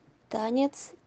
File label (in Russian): танец